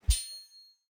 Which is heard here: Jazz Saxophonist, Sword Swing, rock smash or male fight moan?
Sword Swing